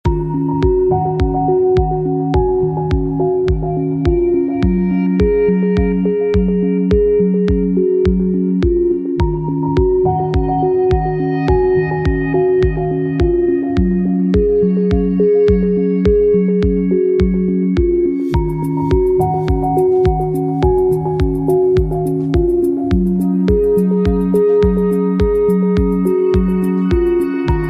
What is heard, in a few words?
bg music